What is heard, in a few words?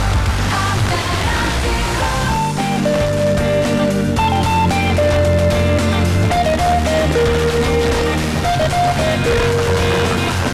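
radio jingle; Avtoradio; Barnaul 103.9 MGc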